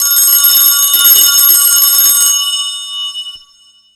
alarm; Ueberschall; Techno Trance Essentials

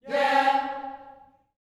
"YEAH" C#4, Gospel; GOSP YEAHS; ILIO; Vocal Planet

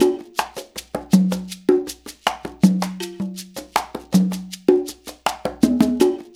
percussion loop

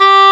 ENG HORN G4, WIND; AKAI S-Series CD-ROM Sound Library VOL-2

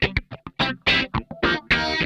RAW, Funk Guitar; 90 s Sample CDs; Zero G

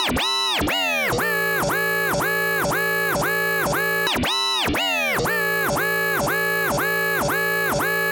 Guitar Crash

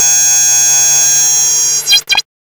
TELEPORT, Hard & Loud Techno; USB Soundscan vol.01; AKAI

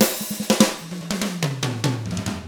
rock 6/8, drums; ILIO; Double Platinum Drums 1

